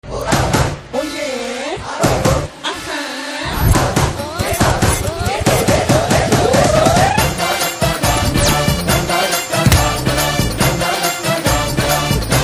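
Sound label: Fun background music Telugu Ringtone; 30 Rojullo Preminchadam Ela Trailer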